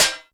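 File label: metallic drum; 90 sSampleCDs; Crypton - DrumBase5000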